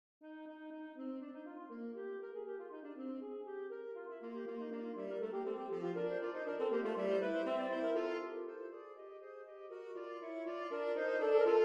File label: Fantasy, saxophone quartet; Album Leaves Mvt 1